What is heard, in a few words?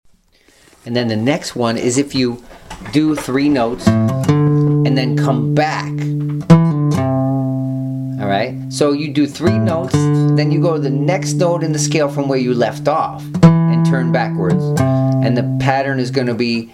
hammer on and pull off exercise